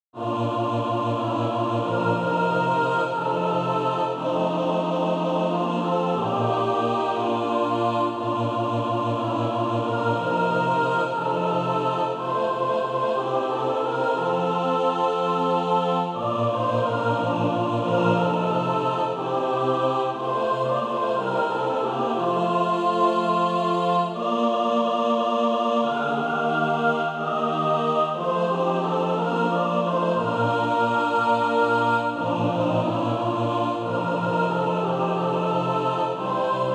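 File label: mixed, SATB Practise Music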